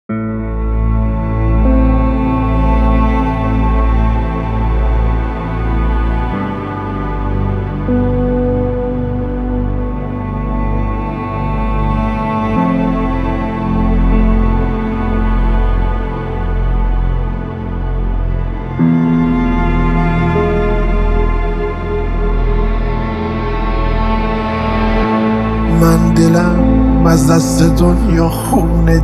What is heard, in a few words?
Siren Wave